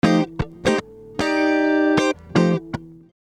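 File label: guitar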